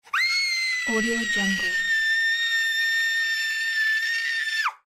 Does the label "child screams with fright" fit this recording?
Yes